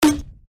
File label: Underbarrel Grenade Launcher